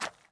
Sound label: footsteps dirt